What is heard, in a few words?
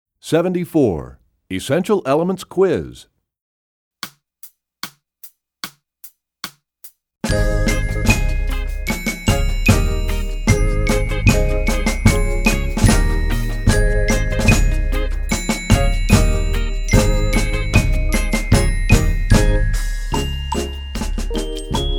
Percussion; EE Quiz; EE2000 Book2